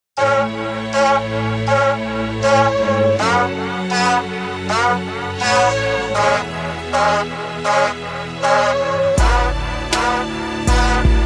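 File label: alarm clock light rock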